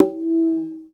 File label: Conga Battery, EMU E-III